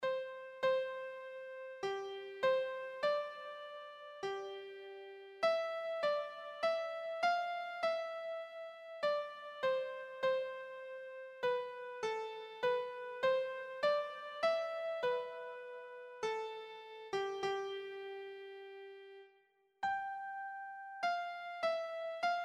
o come, keyboard

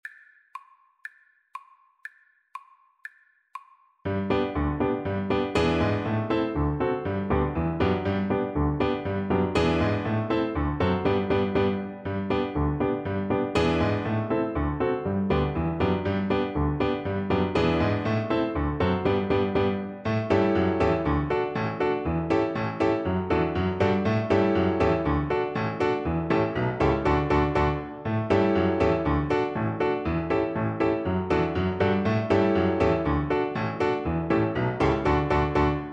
Rheinlander Polka, cello

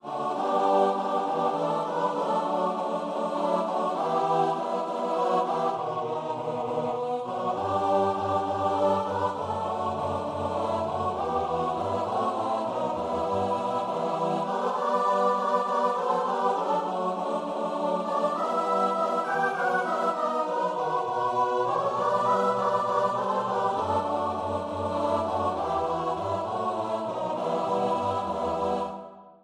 christmasse comes, choir

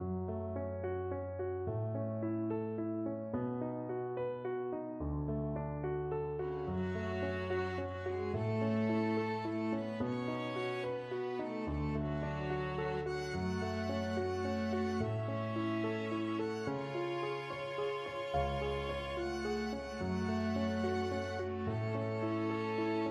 house of the rising sun, violin viola duet

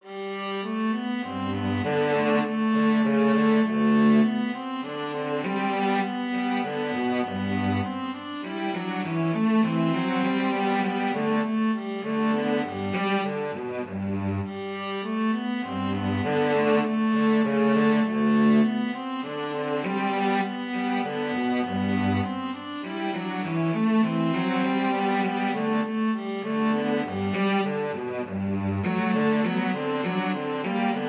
viola cello duet

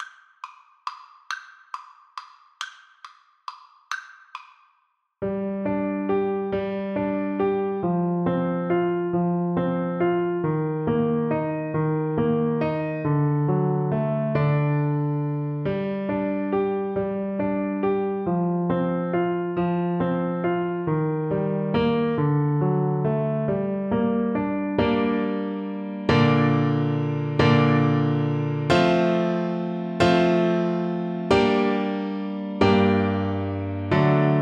greensleeves, Trombone

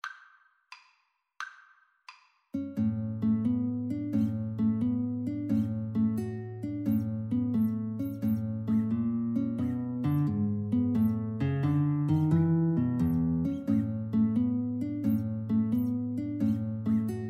Guitar Duet; carulli op120

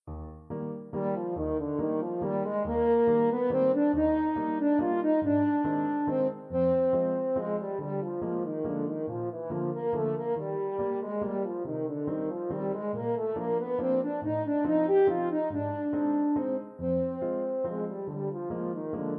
french horn

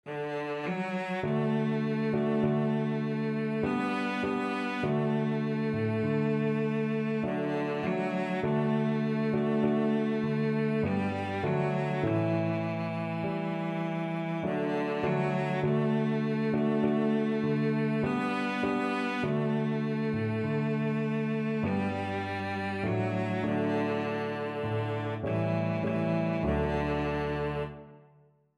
cello

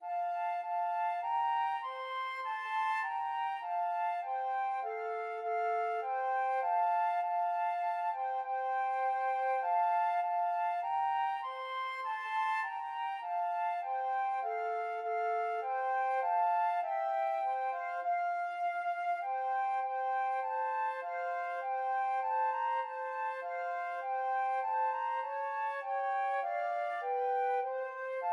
beethoven ode to joy flute duet

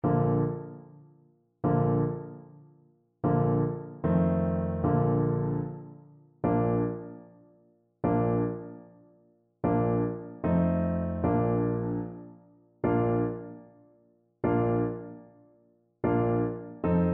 Trombone

